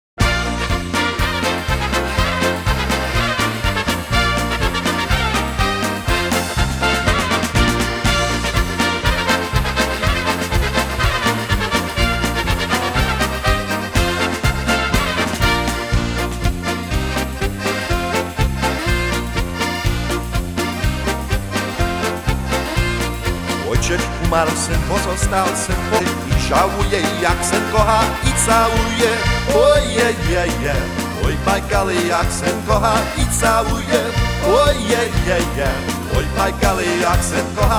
Polka

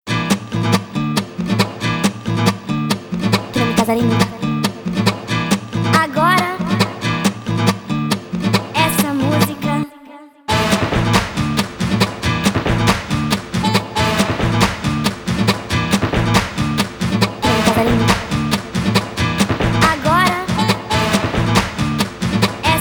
Mariachi

